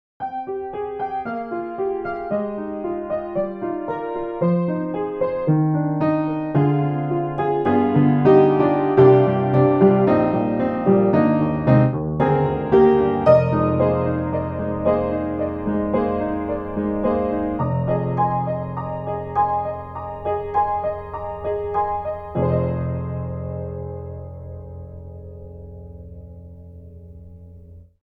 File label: Saloon Piano; VI ONE